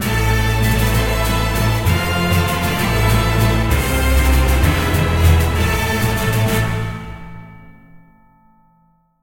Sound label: Stage clear; magicka wizards of the square; android